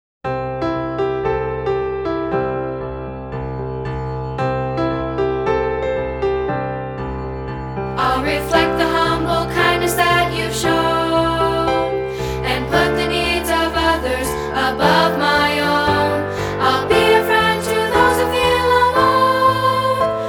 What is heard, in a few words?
Piano